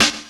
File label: dirty snare f key; drum single hits one shots; snare drum samples